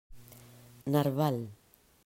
narval

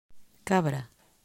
cabra

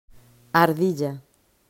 ardilla

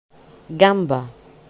gamba

